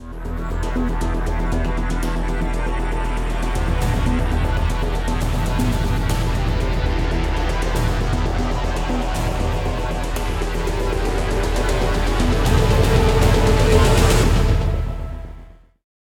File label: Cutscene Dungeon Break